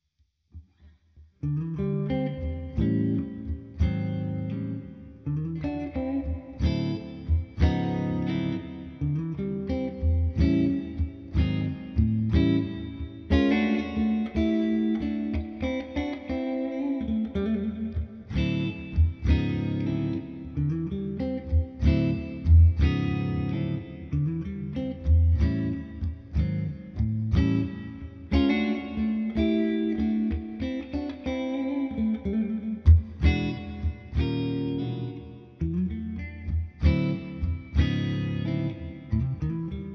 clean, humbucker; CreamGen

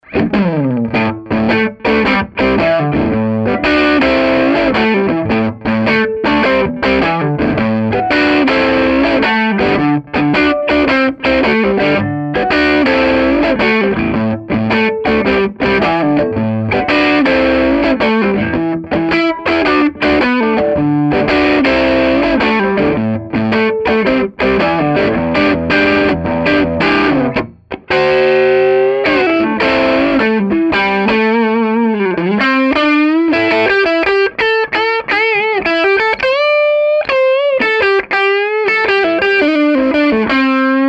crunch neck; schwarzbach; HB59-CreamGen

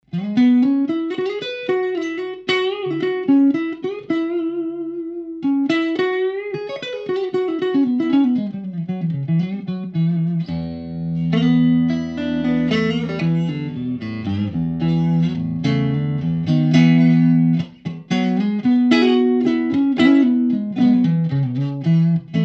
overwound clean neck; schwarzbach

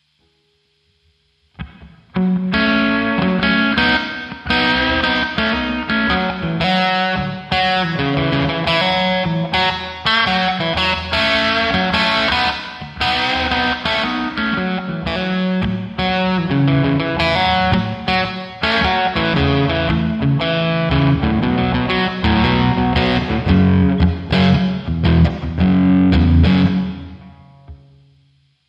Fender OD, humbucker; HardGen

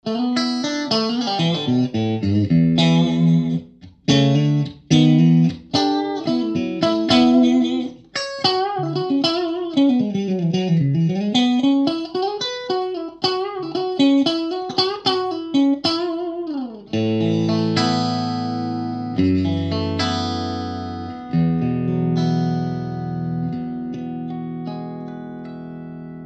overwound clean middle bridge; schwarzbach

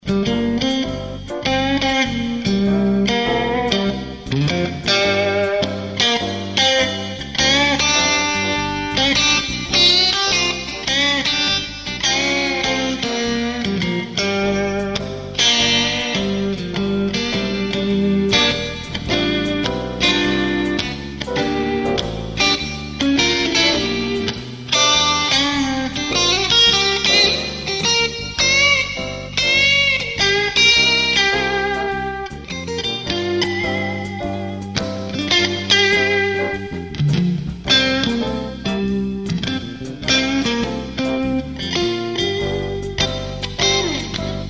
Mitte Marsh Stalone solo, vp strat56

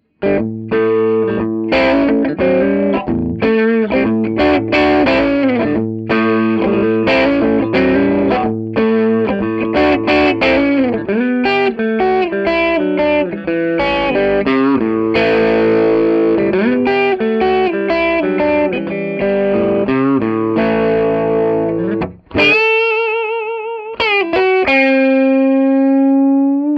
crunch neck bridge; schwarzbach; HB59-GrowlDogs

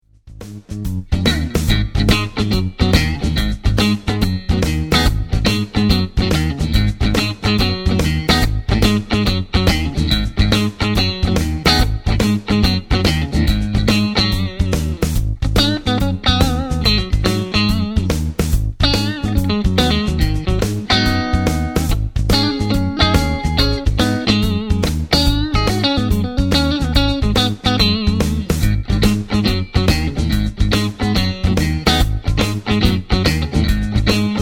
Clean Neck Mid, Strat; schwarzbach; VP-Strat-IrishTour

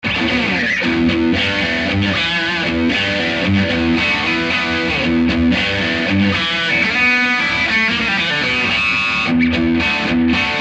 overwound brett bridge; schwarzbach

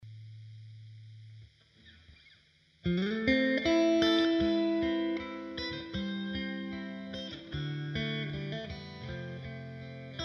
mid, strat

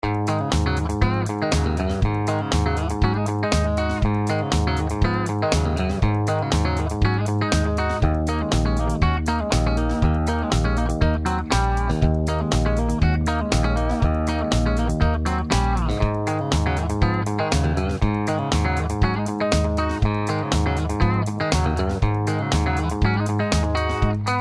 clean bridge hot, Tele; schwarzbach; VP-Tele-57

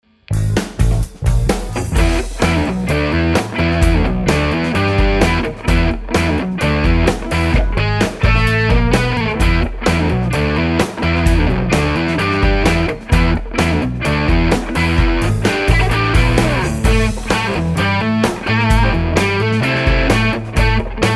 highgain neck; schwarzbach; HB59-GrowlDogs

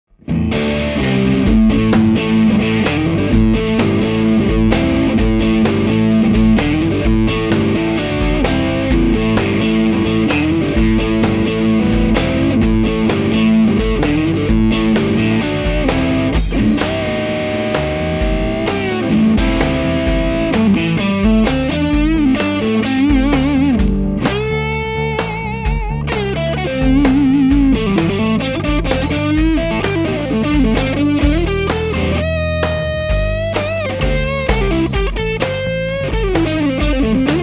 Dist Neck Mid, Strat; schwarzbach; VP-Strat-IrishTour

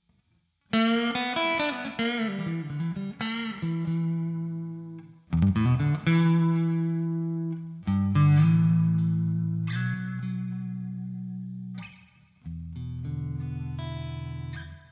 neck clean, telecaster

